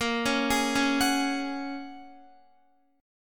Bb Minor 7th Sharp 5th, Guitar; Bbm7#5 arpeggiated

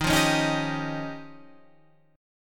F 7th Suspended 2nd Sharp 5th

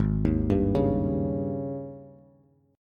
Ab Major 7th Suspended 4th Ab down Bass; arpeggiated; JGuitar

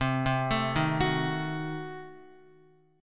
C Diminished 7th C, Guitar 4F,4A,5C,5G,5C,6E; Cdim7 arpeggiated; JGuitar

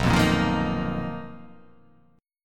B Major 7th Flat 5th B down, chord shape

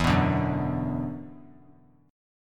E 7th Suspended 2nd, down; E7sus2; strummed

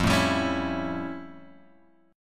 F Augmented Major 9th F Guitar Open F; F+M9; strummed

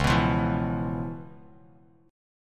Db 7th Sharp 9th Db, down, Bass Standard; strummed; JGuitar

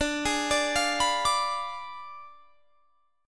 D Diminished 7th D down; Ddim7 arpeggiated

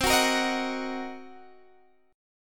C Minor 7th Flat 5th Guitar Open D, chord

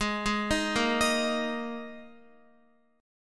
Ab Suspended 2nd Guitar; arpeggiated; Absus2

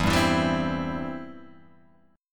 E Minor Sharp 5th E down Guitar Open G

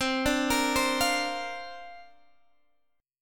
C 7th Suspended 2nd Suspended 4th, guitar

